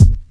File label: Kk, Kicks